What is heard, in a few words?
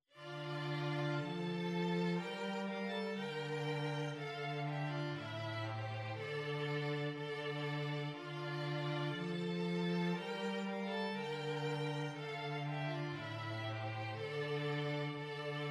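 Bim Bim Bam vln vln vc, violin violin cello; Jewish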